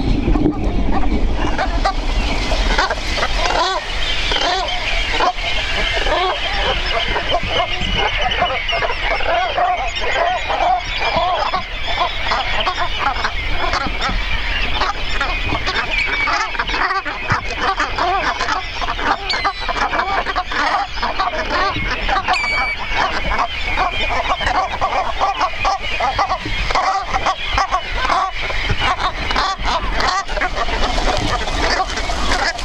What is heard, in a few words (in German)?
Ringelgans; trischen